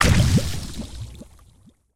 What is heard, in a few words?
Bubble Splash; Game rip; MiSide